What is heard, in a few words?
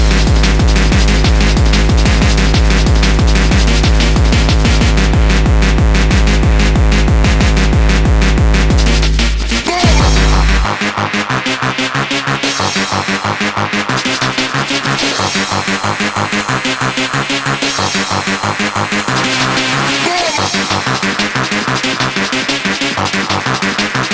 boom